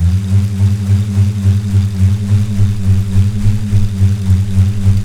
bull, bullroarer, primitive instruments

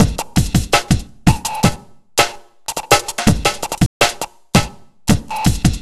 Cheep Beat, Drum N Bass; Sonic Foundry (Sony Creative Software); Knutrix Drum & Bass

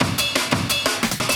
Ride Break

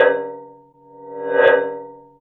Synth Perc; Dream Zone

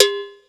Afro Cowbell, Ensoniq ASR-X Pro

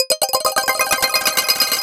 Synth Arpeggio, Synths; Eclectic Electronica

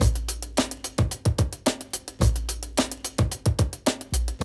break; Zero G - Man Machine

